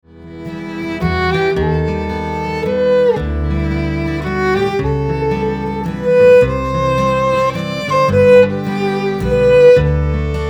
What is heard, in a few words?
waltz, violin guitar & bass